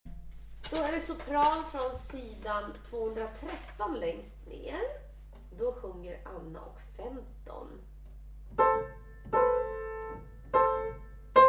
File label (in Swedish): sopran